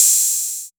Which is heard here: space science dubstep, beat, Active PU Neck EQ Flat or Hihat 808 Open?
Hihat 808 Open